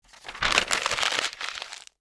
paper rustle; Books and Paper

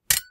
sword Stone; Foley; Kenney's Sound Pack

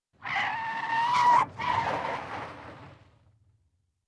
Car, Braking Skid; Emergency; BBC Sound Effects Library

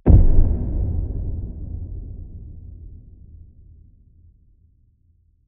Punching Percussion, designed; 99Sounds

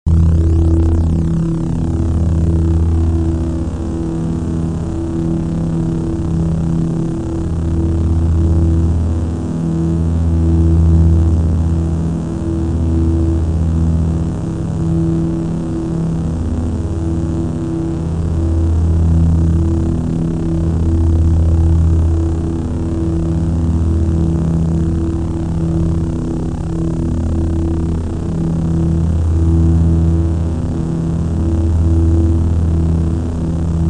Saber Drone; FXHome

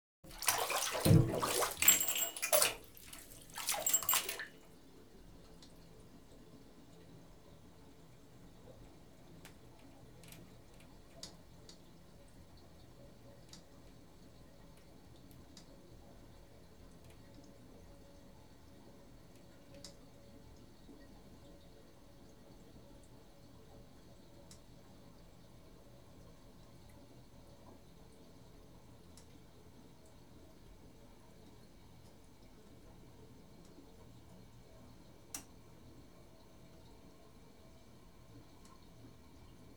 Bath, Emptied; Household; BBC Sound Effects Library

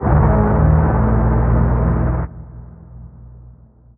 Braam (G#), Trailer; Federico Soler Fernandez; Effective Trailer Braams

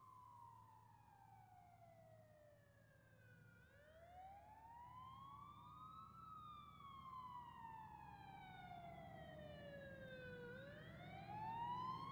Fire Engine, Pass R To L With Wai; Emergency; BBC Sound Effects Library

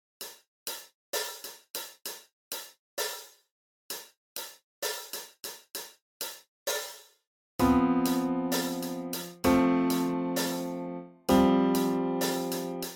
Chord Progression

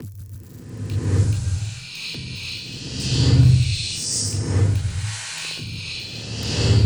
Loop - 140 bpm; 99Sounds; Mangling Audio; Mangled Software; Convolution Abuse

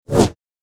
Double Bladed Saber Sound; FXHome

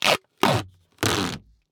Duct Tape stereo pulling; GDC 2020 - Game Audio Bundle; Hear and Now Sound - Tape Tape Tape!! The Essential Sound Library for Tape Sounds

